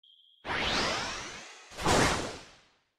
appear

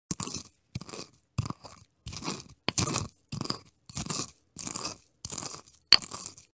masher, گوشت کوب

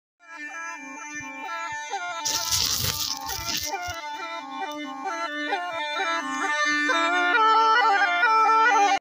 snake flute

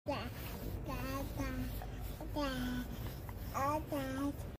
Baby without words; صدای کودک بدون گفتن کلمه ای

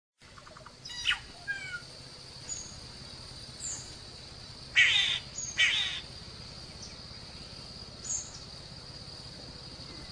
Solitary cacique

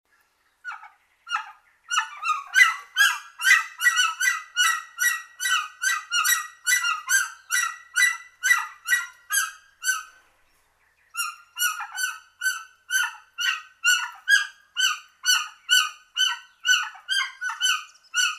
White winged guan

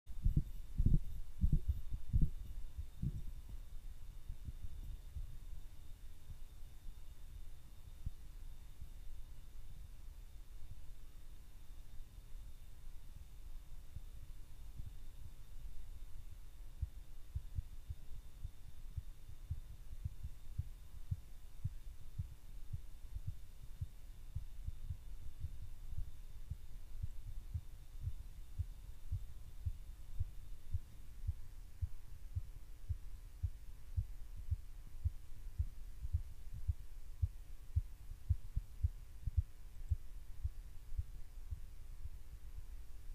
manual blood pressure monitor

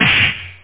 Punch Sound

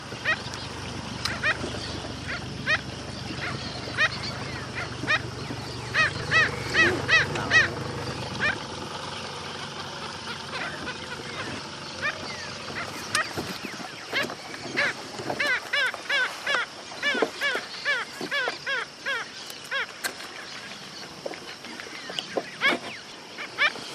Black skimmer; پرنده آب شکاف سیاه

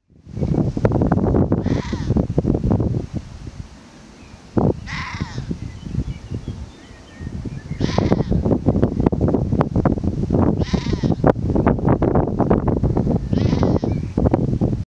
Water deer

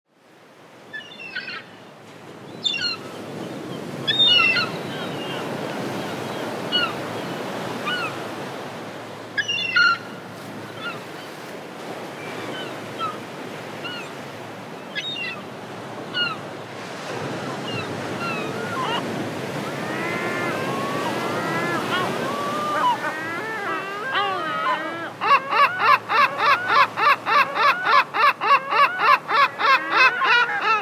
seagull sound

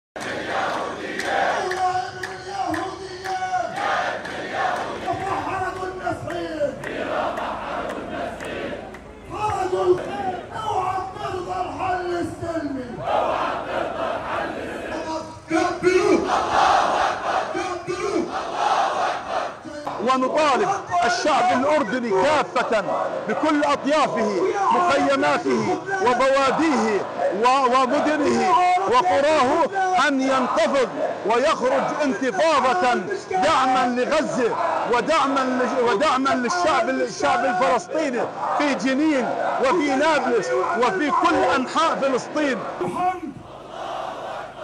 Arab crowd; همهمه عربی

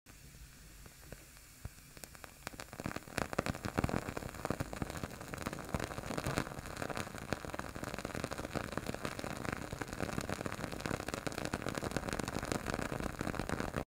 Burning wooden wick; سوختن فیتیله چوبی

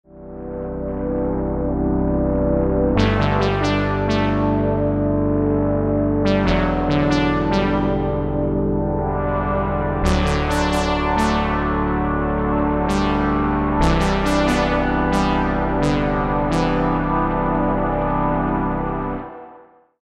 Synthesiser

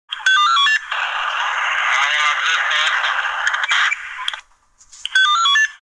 Wireless walkie talkie

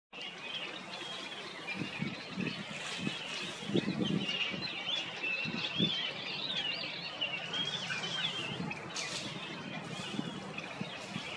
Eophona personata

